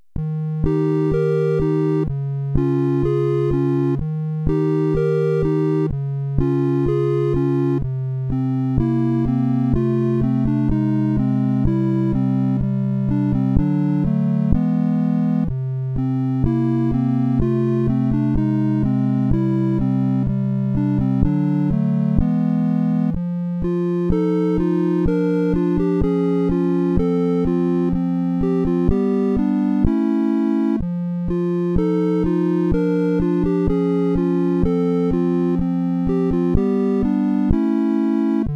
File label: swamp; C64 soundtracks